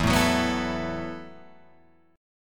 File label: E Suspended 2nd Suspended 4th E, Guitar, Open F; strummed; 4,4,6,6,7,4; JGuitar